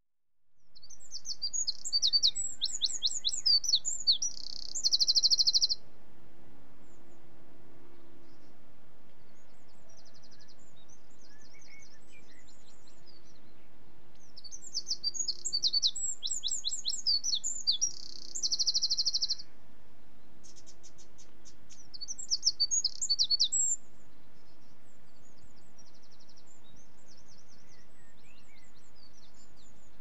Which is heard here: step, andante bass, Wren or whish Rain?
Wren